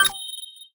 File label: Shutter; Sony Ericsson Xperia X8; camera